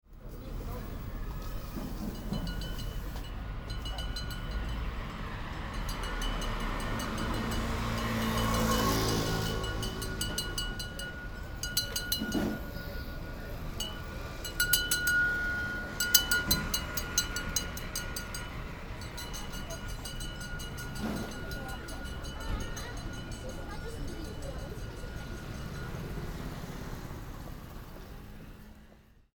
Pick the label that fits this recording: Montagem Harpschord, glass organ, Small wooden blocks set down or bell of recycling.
bell of recycling